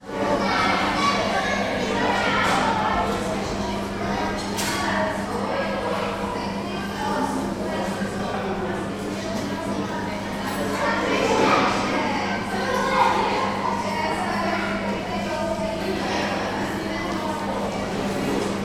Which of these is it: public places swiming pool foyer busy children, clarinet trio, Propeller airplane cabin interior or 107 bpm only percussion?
public places swiming pool foyer busy children